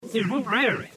vibrato

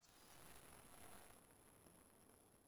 bell; bell of armor

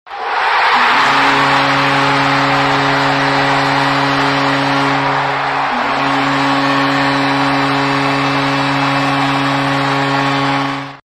crowd pop w horn

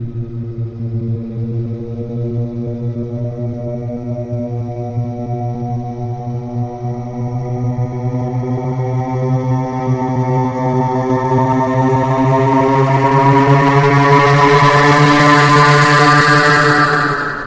wah

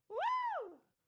cheer

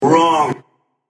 wrong

